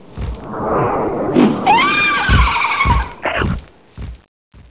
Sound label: DIVEBOMB, DINOSAUR